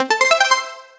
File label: triumphant video game lev